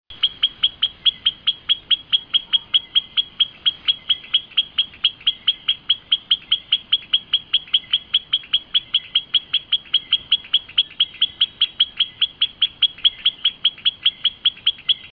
leptodactylus albilabris carolina puerto rico; A loud chorus / of its "pink-pink-pink..." / calls; West Indian

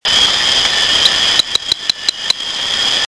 Pristimantis shrevei; The call of this species is a low series of "ticks", difficult to hear until one is close to the animal; vermont nature reserve saint vincent; Mount Soufriere, northern Saint Vincent, Saint Vincent and the Grenadines, Lesser Antilles; Audio file courtesy of Dr. Luis O. Nieves; West Indian

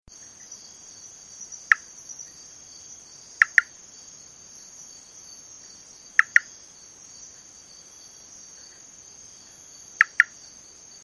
Eleutherodactylus pantoni Barbecue Bottom Jamaica; north-central Jamaica; The call of the species is usually a hollow, two-note noise.; West Indian

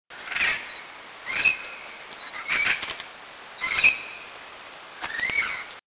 amazona arausiaca; syndicate dominica; westindian

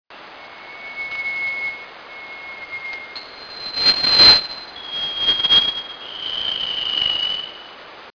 Myadestes genibarbis; syndicate dominica; West Indian

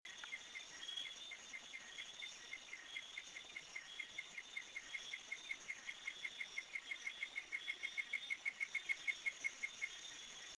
eleutherodactylus gossei port antonio jamaica; Jamaican forest frog; north-eastern Jamaica; The call of this species is a series of soft whistles that accelerate before coming to an abrupt end.; West Indian

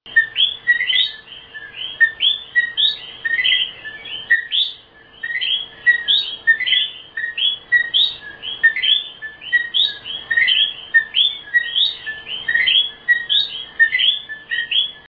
eleutherodactylus coqui mayaguez puerto rico; The calls of populations found at or close to sea level are short in duration and high in pitch; West Indian

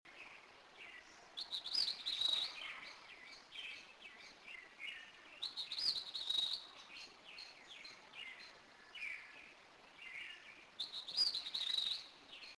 tyrannus dominicensis aguas buenas puerto rico; West Indian

